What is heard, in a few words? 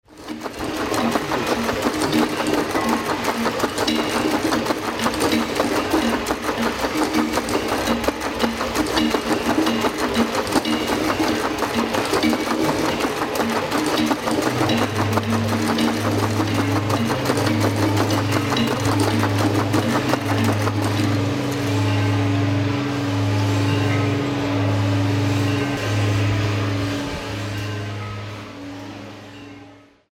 percs